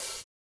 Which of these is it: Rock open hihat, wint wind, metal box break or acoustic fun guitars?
Rock open hihat